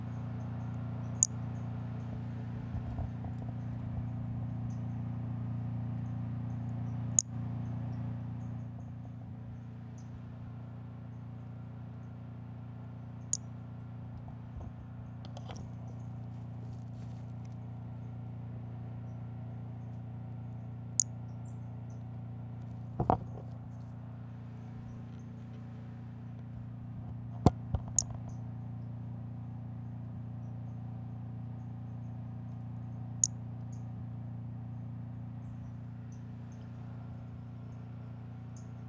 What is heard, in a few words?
Brachycephalus albolineatus